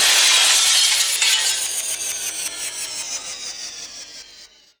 cymbal glass

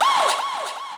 vox

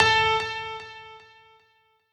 piano sabi

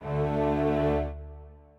strings

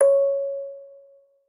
musicbox

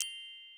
gloken

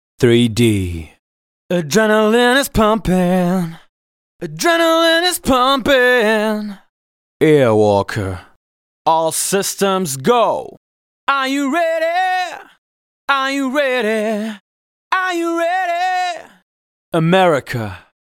Fab Acapella; Techno Trance Essentials

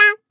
Vo Main

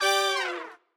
trampet down

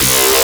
bass

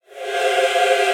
choir

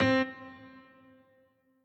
piano riff intro

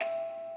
musicbox

